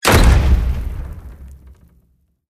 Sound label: Door Wood Close Slam; the unfinished swan